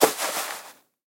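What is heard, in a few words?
baseball sounds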